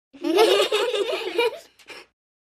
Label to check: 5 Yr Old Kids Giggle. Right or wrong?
Right